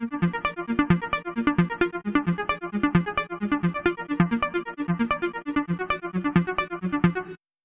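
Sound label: Arps, Phaser; Be sure to keep it in stereo.; Roland Cloud BOSS Effects Pedals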